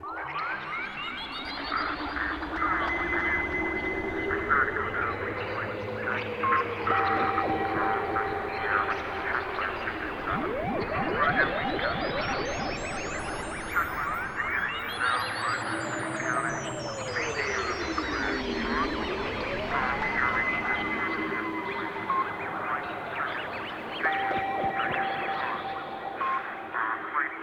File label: SFX Space Amb; BopIt SFX SpaceAmb 2